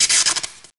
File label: Mega Beast Core Egg Charge start; A Robot Named Fight